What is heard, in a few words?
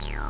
prophet5 acid, synths; Zoom - Release 2 (1996); Active Software